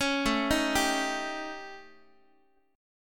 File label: A 7th Flat 5th Guitar; A7b5; arpeggiated